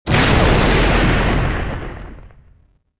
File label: damaged; atst